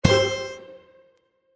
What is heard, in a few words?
winning Line; Enchanted Prince 2; Eyecon